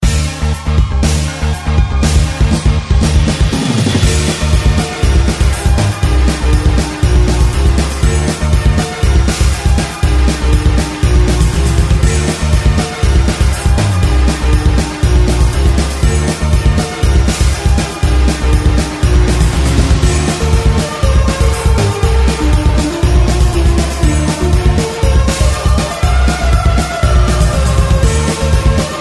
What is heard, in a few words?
Sport Ring; meloboom